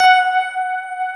SYN K-2000, Roland

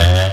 Misc Short FX

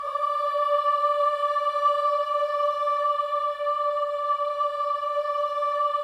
BOYS AHS; Spectrasonics; Symphony of Voices

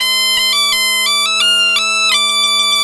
AM BP, Celtic Flavours, BAGPIP085; USB Soundscan vol.42; AKAI

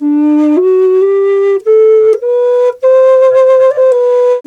D2 108, Didgeridoo; Big Fish Audio - Didgeridoo and Other Primitive Instruments; FLU 108 BPM

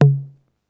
Tom Bounce; Lost Tapes From Mars

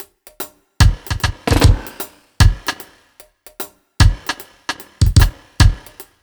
BT, Ragga & Dub Reggae

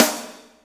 SNR PICC, Snares; Roland - Rhythm Section

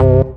DM ORG1, Dance Mega Synth

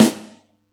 Yamaha EX-5

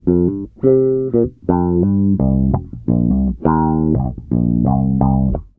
FUNKY 86 C, Bass Grooves; USB Soundscan vol.30; AKAI

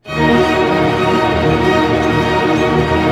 ARP D#, STR ARP MIN; Sound On Sound - Peter Siedleczek's Orchestra

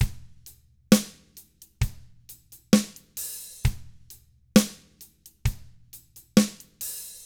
SLOW ROCK, Drums; 66SLOWROCK

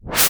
IMP WHOOSH

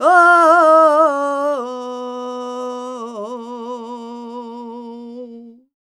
voc a#, Flamenco, Vocals, Female Cantaora; Female Cantaora 2

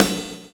PULL; Spectrasonics - Backbeat

